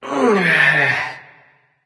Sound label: nv grunt lift; cernunnos